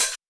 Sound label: hihat